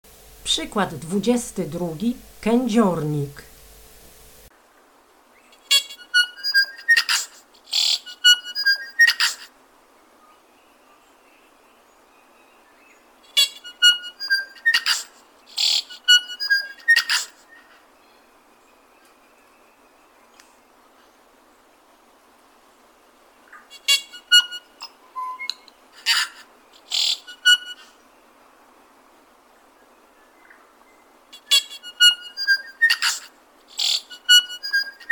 kędziornik, ptaki

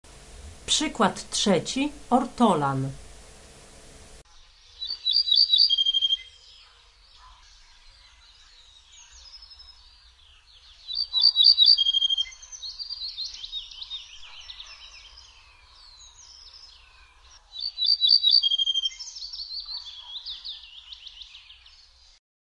ortolan

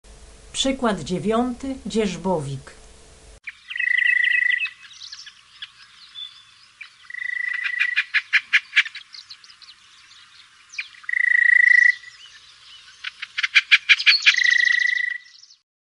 dzierzbowik

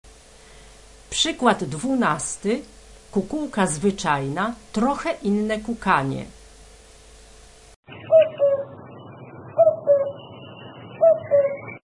kukułka zwyczajna

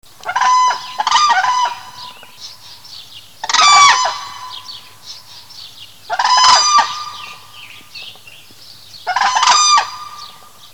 żuraw; Przyroda ptaki i zwierzęta

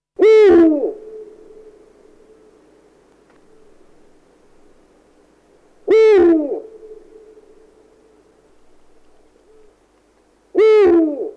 puchacz, ptaki; Przyroda ptaki i zwierzęta

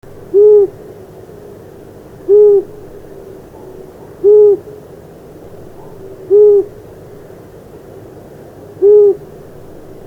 sowa uszata, ptaki; Przyroda ptaki i zwierzęta

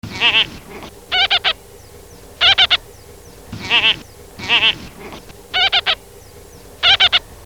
batalion, ptaki; Przyroda ptaki i zwierzęta

